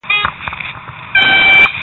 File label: airzound vs car horn